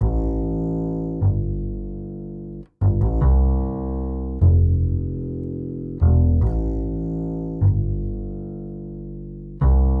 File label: Bass Main